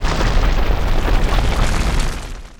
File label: Ice Breaking; game rip; lego ninjago rise of the snakes